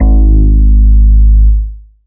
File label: Ultimate Bass G# Root G#; Vengeance Samples